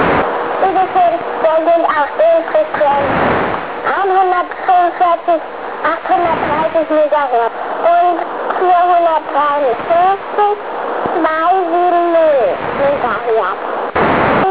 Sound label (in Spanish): telemetría idefix